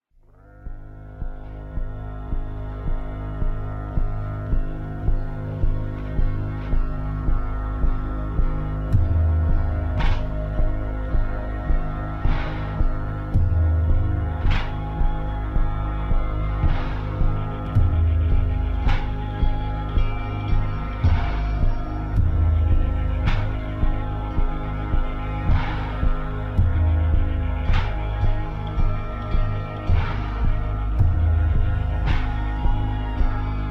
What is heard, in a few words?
character theme; measurehead